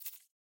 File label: Fusebox Spark